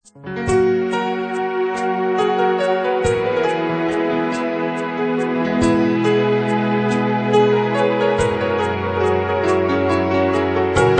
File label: Ghazal